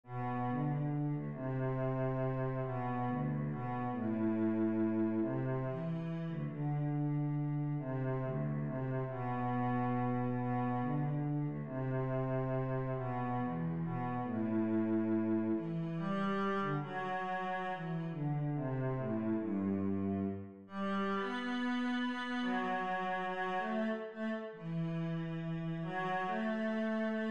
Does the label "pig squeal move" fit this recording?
No